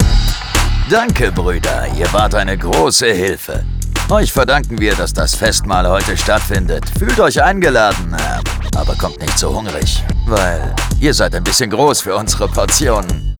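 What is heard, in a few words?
Outro Victory German; arthur and the revenge of maltazard; wii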